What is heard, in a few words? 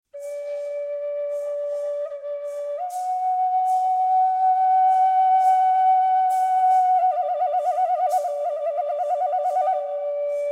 FLUTE 1 phrase